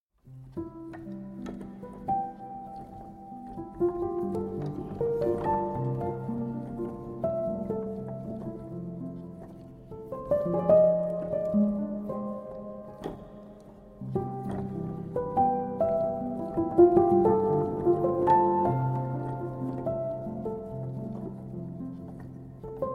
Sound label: Solo Piano Version